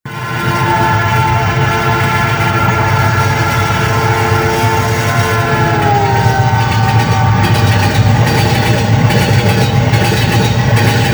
Train sound Indian railways; Satish Madiwale

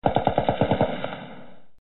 Ak47

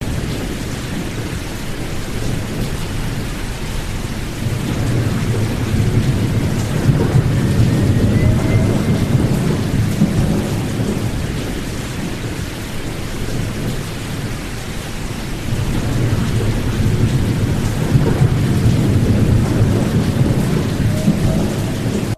Monsoon

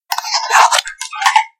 Strange mechanical sound; Marianne Gagnon

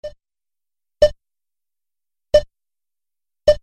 Grocery Scanning

